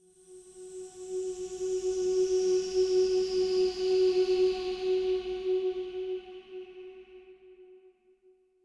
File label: ambient beautiful destruction, synth; Portal 2